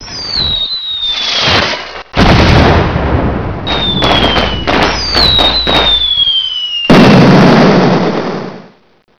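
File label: fireworks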